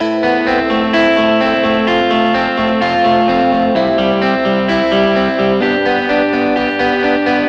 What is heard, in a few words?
Electric Pop Guitar 128BPM Amin; Electric Pop Guitars; Vengeance - Essential Clubsounds Vol.4; Guitarloops